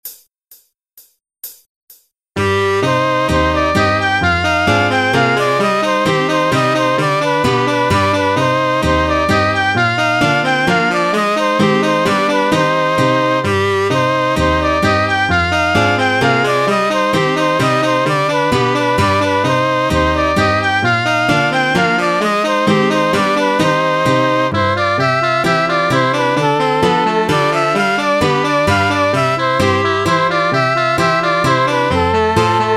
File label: Mazurka; Mazurka d'Embrault